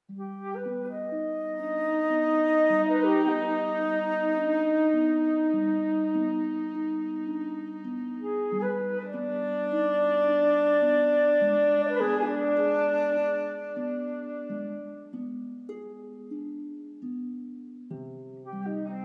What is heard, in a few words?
Study for Flute Viola Harp